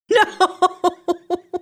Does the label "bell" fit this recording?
No